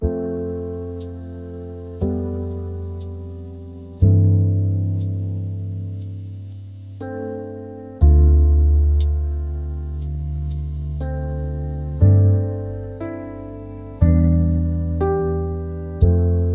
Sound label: rain piano sleep